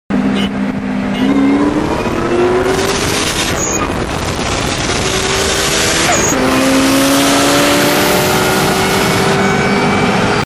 Supra turbo

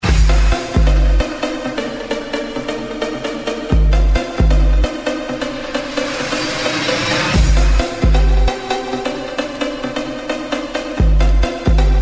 Bass

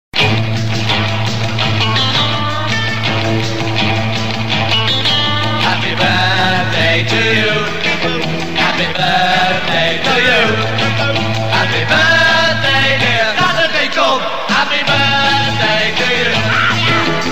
happy birthday song

